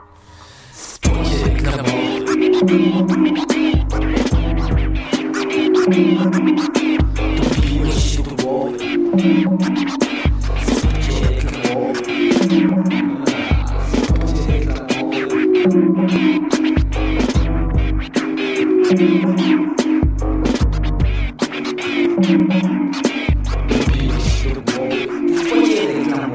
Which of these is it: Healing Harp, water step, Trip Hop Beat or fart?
Trip Hop Beat